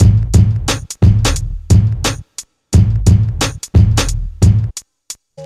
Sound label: 88 bpm drum beat G sharp key, kick tuned to the G# note; drum loops